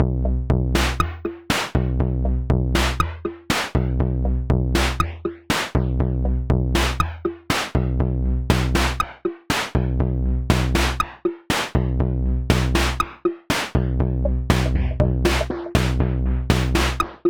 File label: 120bpm beat